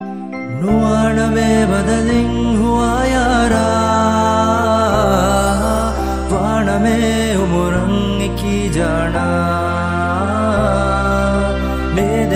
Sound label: best dhivehi song ringtone